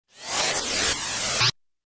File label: Noise Swirl